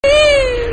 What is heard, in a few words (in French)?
Hibou Moyen Duc; strigides; Oisellerie Galan